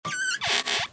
chest open creak; spookonomics

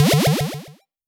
Jump, 8-Bit; 8-Bit Kit Volume1

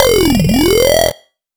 Robot Talk, 8-Bit; 8-Bit Kit Volume1

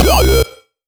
Robot Talk, 8-Bit; 8-Bit Kit Volume1

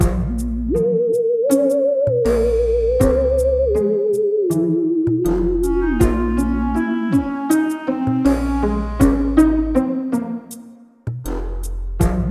Vampires LOOP (All Instruments); spookonomics

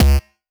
Kick, 8-Bit; 8-Bit Kit Volume1

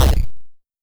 Kick, 8-Bit; 8-Bit Kit Volume1

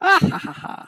witch cackle; spookonomics

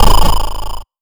Blip, 8-Bit; 8-Bit Kit Volume1

